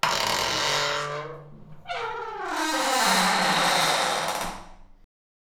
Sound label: handle